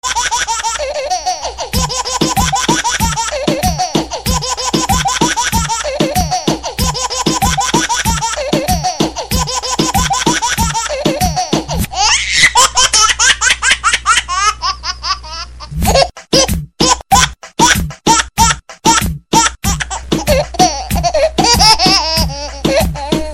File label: baby lough music